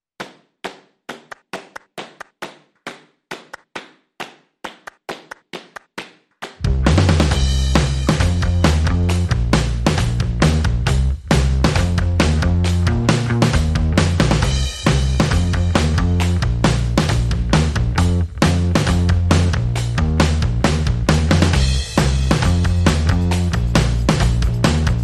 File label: Drums and Bass Only